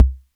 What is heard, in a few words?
KICK, drum kit; Polyend; Stazma & Concrete Collage